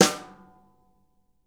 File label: BRSNR, drum